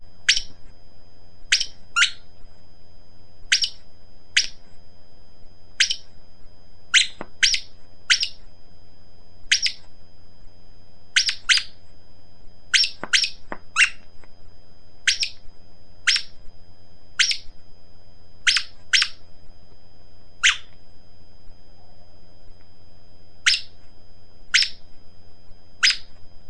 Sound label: rodent squeaker